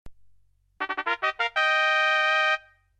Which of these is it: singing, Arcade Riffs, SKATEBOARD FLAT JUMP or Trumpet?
Trumpet